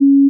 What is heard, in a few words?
D flat 4, pure tones